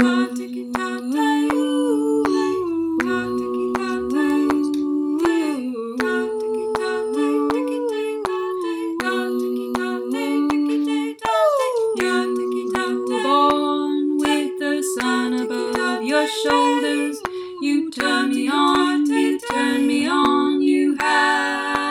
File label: greek song - equal